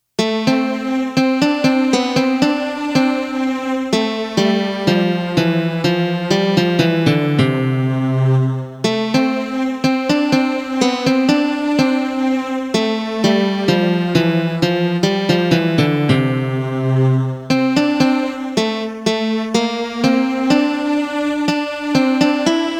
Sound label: theme song